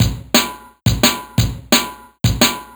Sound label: DNB BREAK